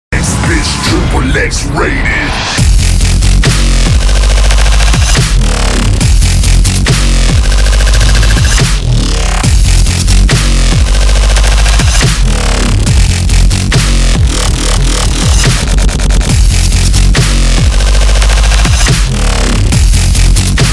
DUBSTEP

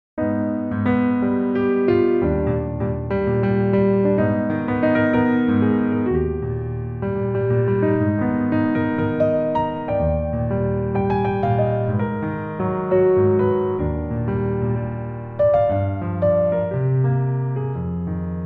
Smooth Lounge Piano

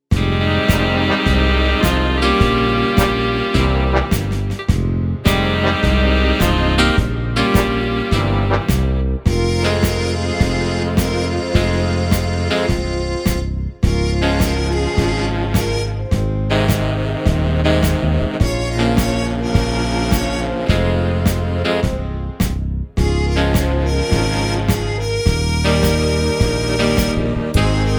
Jazz Club

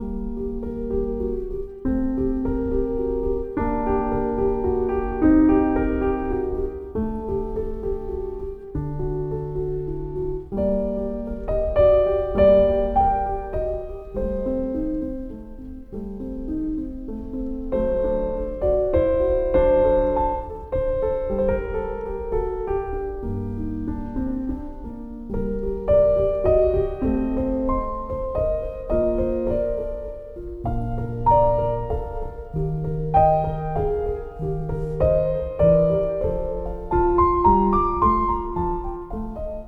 Classical Music, Siciliano

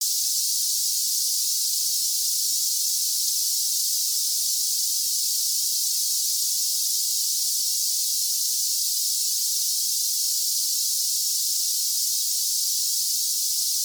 Pink Noise

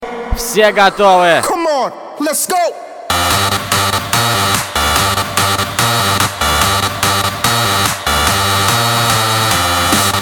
HardStyle JumpStyle Shuffle

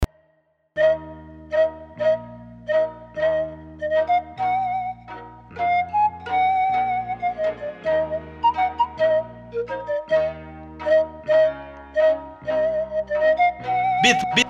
instrumental

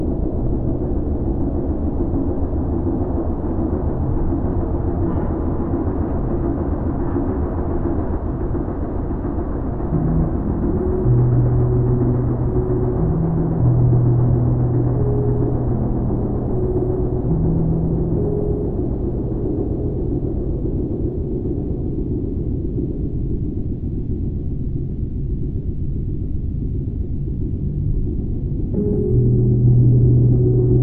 White Noise

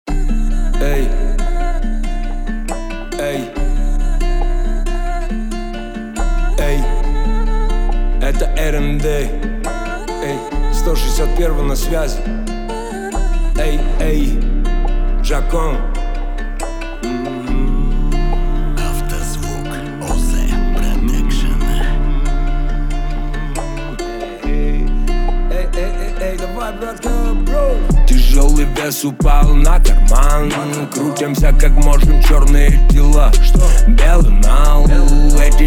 31-35Hz Low Bass

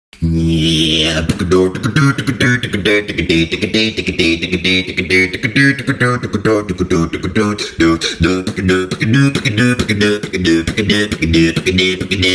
Beatbox

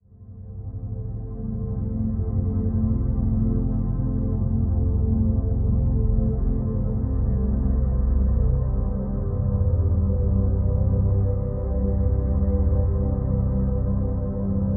Cat Spa Calming Music for Your Cat

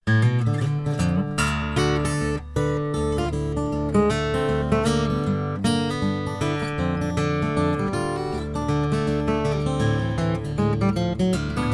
Instrumental

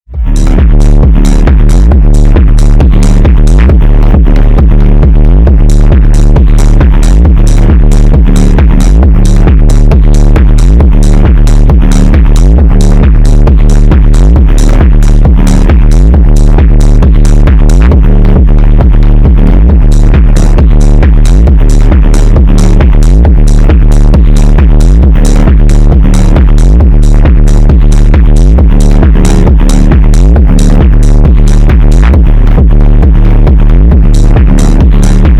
PHONK ULTRA BASS BOOSTED PHONK

